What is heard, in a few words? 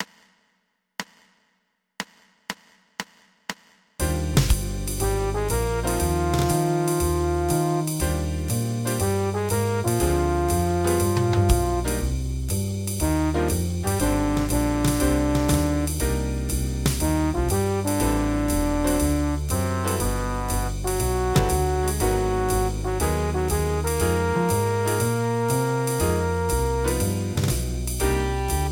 Septet